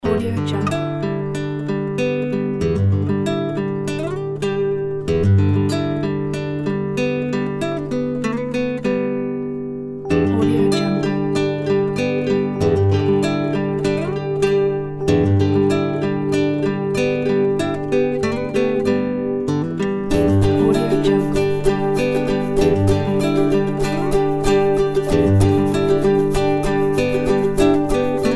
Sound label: folksy loop